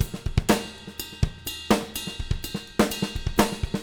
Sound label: 124, Living Drums